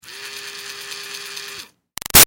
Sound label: Toy Fairy Wand CU Run Short; Miscellaneous Toy; Sound Effects:Toys; Big Room Sound